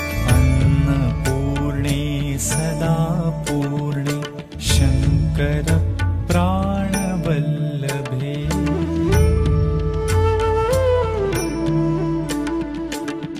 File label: Annapurna Shlokas, Durga Devotional, Devi Mantras